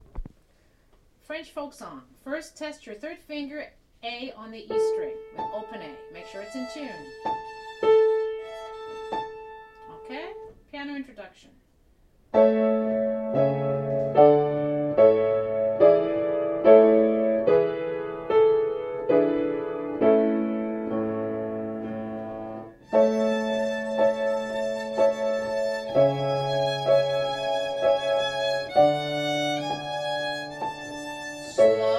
French Folk Song, Strings, Practice Recordings, Beginner